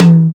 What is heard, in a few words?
Tom 505; Drums; One Shots